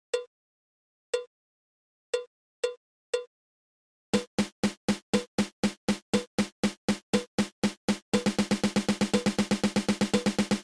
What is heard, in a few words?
Double Stroke Roll